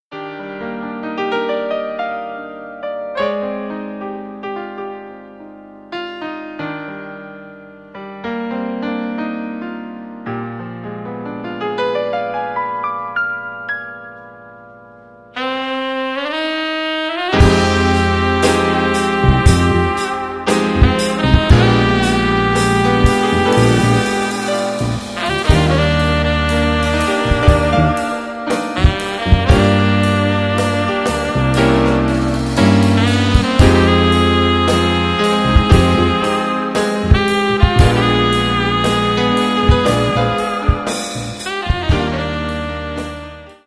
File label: welikefunkyjazz